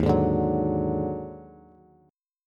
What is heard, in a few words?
D sharp Minor Major 7th Double Flat 5th, Bass; strummed; chord; 11,11,12,11